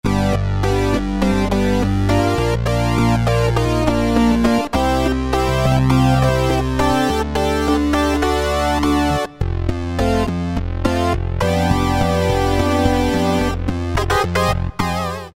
Poly Pulse, Roland Alpha Juno-2; Factory B

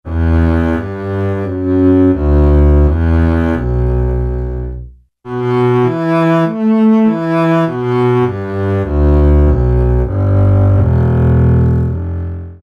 STR Cb mf vb, Strings; Roland L-CDX-04 Strings And Orchestral Percussion

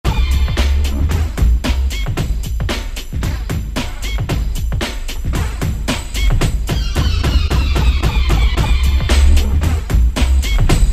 Tune Up; Dance; Roland SR-JV80-06

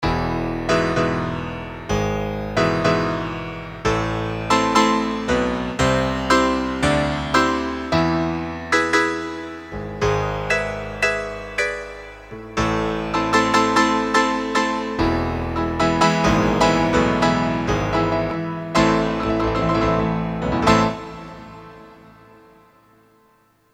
G. Piano, Piano; Korg DSS-1

